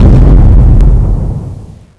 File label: mech walk